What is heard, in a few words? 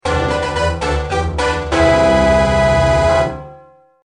Goal Reached Fanfare; Zelda